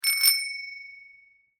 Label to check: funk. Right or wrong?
Wrong